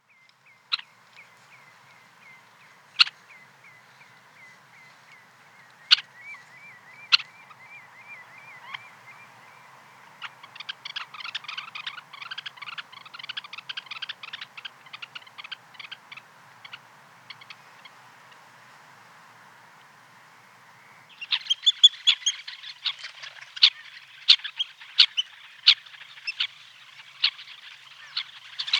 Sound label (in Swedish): smalnabbad simsnappa; fagelljud